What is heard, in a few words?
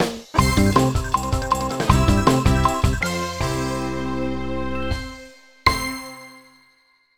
Sting; active health with carol vorderman; nds